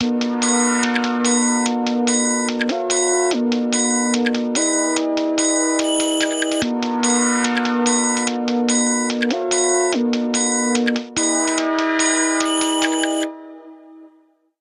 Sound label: clockwork music, alarms; Sony Xperia Z2